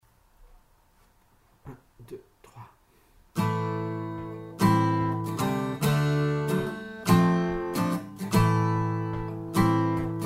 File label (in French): la la le ri accords